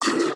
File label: recycler vox; Factorio